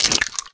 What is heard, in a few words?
Egg Hatch